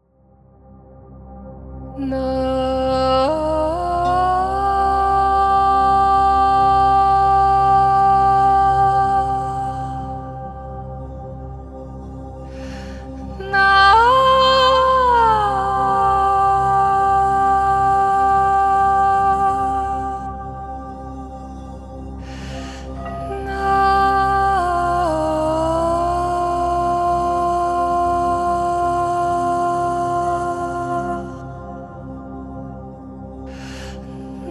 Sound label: in C Bowl